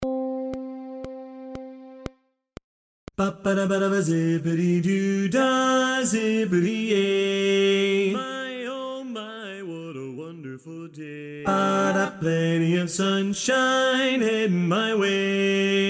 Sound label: Bass